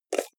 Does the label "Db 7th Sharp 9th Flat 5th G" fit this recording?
No